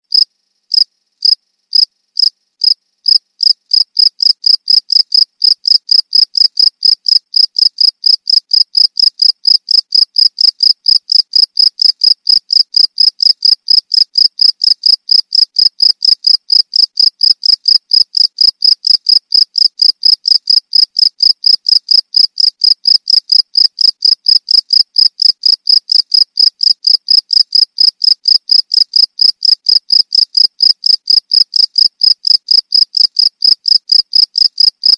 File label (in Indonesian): jangkrik sawah